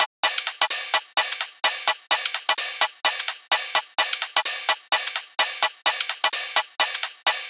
Hihat Loop - 128 BPM; Drums; Loops; Top; House

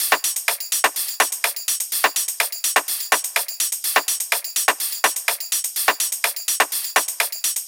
Percussion 125Bpm, techno; Percussion Loops; Bugout; Ghosthack Advent Calendar - Day 6 - Techno Kit

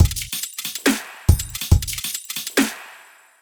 Drumloop, Dubstep; GhostHack

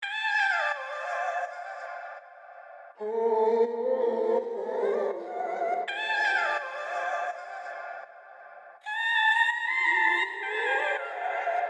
Vocal Chop, 164 BPM D Min; A Distant Memory; Cymatics; Infinity Vocal Collection

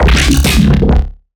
Riddim Bass Hit; Cyberpunk; One-Shots; Bass Hits; Ghosthack; Riddim Essentials Volume 2

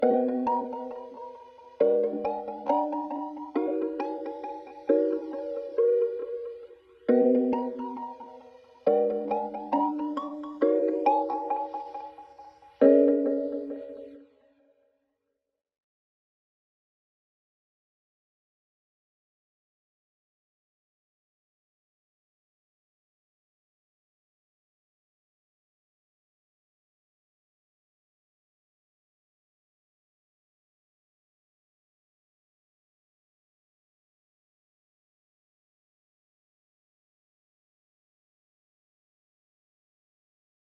68 BPM C# Min Mallet; Cymatics; Serenity

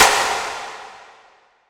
Impact; Cymatics

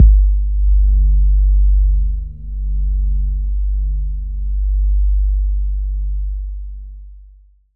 Average Bass, Hits

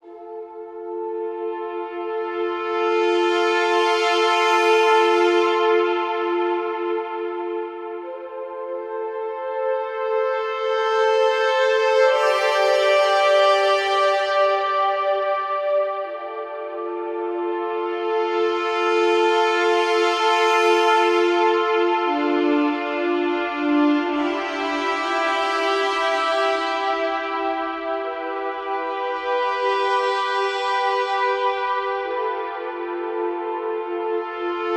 Brass Swell - Fm 60Bpm; Construction Kits; STEMS; Ghosthack; SkyRealm; Kit 04 - High Rock View

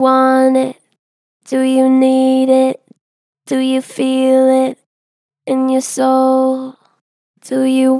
Pitch Flat Gentle, Vocals; Do you want it; Harmor Vocals

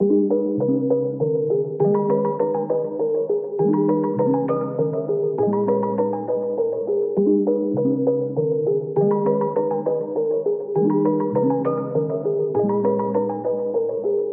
Various Melody Loop - 134 BPM G Min Pluck; Melodies; Loop Stems; Cymatics; Oracle Sample Pack; Oracle Various Melody Loop 10

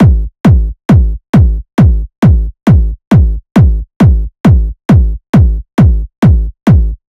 Kick Loop 135, Techno Drums

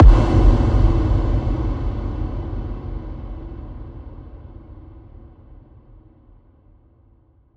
Impact Distant; Riddim; One-Shots; SFX; Ghosthack; Riddim Essentials Volume 2

